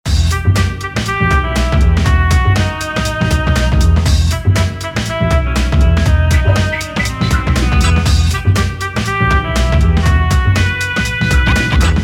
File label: The Dance Hall Dark Hallways Theme